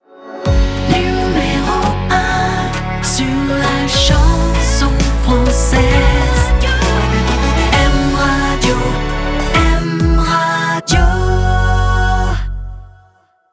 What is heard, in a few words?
Jingles; PURE Jingles; M Radio